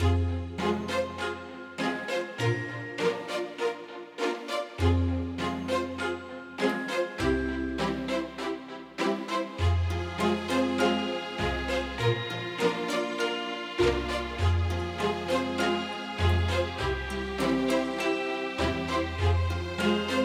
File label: Frozen Bubble Menu Loop; Tax Fugitive